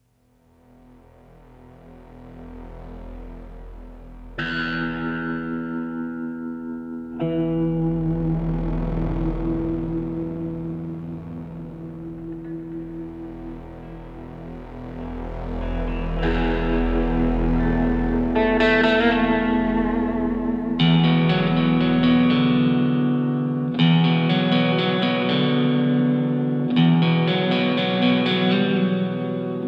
musique ambiance suspense; Sound Fishing

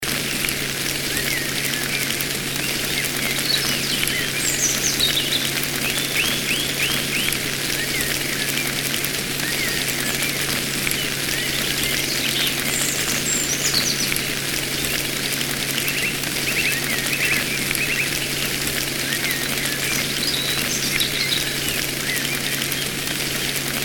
forêt avec pluie et oiseaux

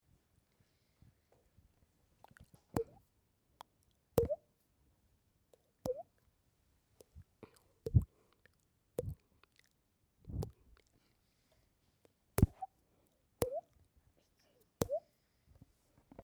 la goutte

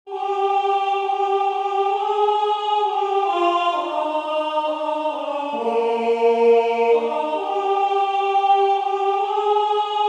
2è voix contre chant

